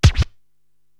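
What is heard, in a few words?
DJ BD Rub, bass drum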